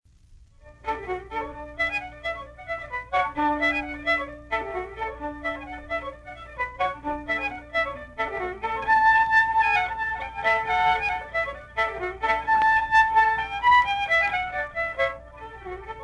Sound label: Fiddle solo; KY 1937; Lomax